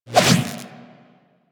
Electro Prod Whoosh; Warframe; Weapons

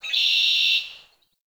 Tree Birch Bird; Trees

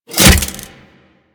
Throwing Taser Sticky Bomb Adhere; Warframe; Tenno

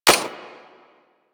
Fire Mech, Weapons; Lato Redux

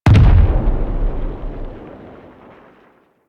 Golem Step Far; Warframe; GolemRemaster; Plains; Footsteps

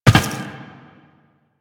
Land Glass, Footsteps; Warframe; Tenno

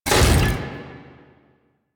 UI Scrap Container Unlocked; Warframe; Missions; Zariman; Zariman Assassinate

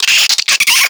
Infested Minion Bark, Vocals; Warframe

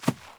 Fawn Step; Encounters; Animal Rescue

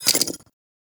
Rifle Mech, weapons; Gyre

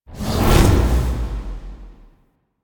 Magnetic Field Cast; Warframe; Focus; Unairu

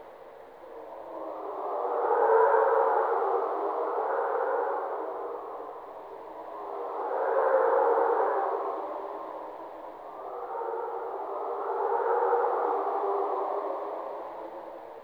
Interior Wind Howl Bed Loop; Warframe; Ambience; Sentient Devourer

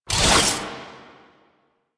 Whoosh, Warfan; Warframe; Tenno; Broken Frame; Quassus Prime

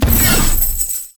Shared Fate Chain Stop; Warframe; Warframes; Pax Duviricus

